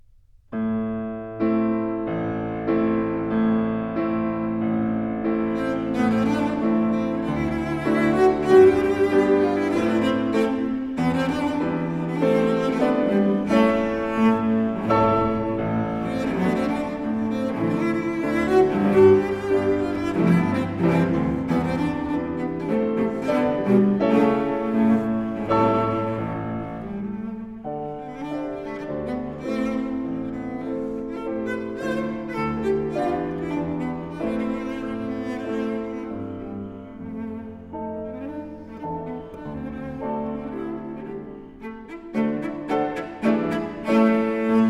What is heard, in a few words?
Romanian Folk Dances; Bartok